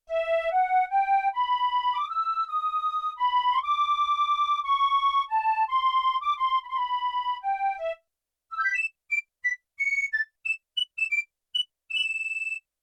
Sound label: Chorus Piccolo; Yamaha TX16W